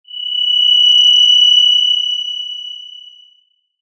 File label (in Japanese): キーン